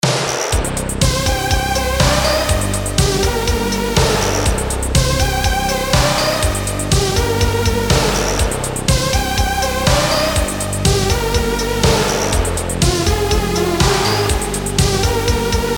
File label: ringtone